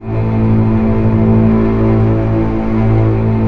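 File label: strings A0 PP L; Sound On Sound - Peter Siedleczek's Orchestra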